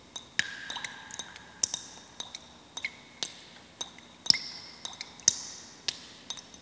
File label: drips